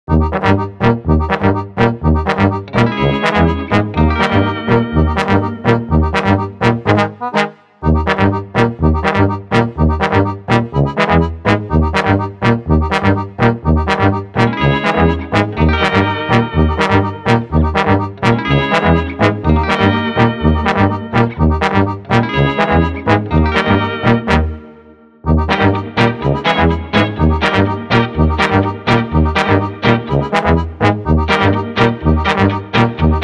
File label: Barrel Dance Underscore, Balkan Brass